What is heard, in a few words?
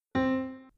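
note sounds; zvuki not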